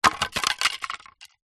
wood small thin pieces drop on hard ground slight bounce; zapsplat